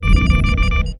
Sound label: ambient bridge, computer